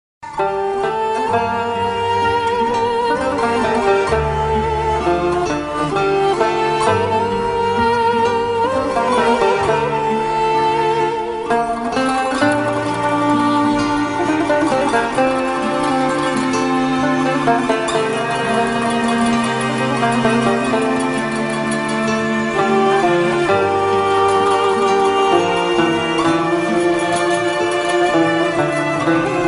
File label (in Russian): krasivye melodii lyubov